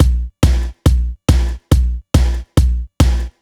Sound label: Kick Loop, 140bpm